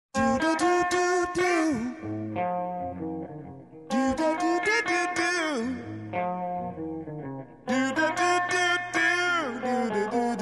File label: Acoustic Woman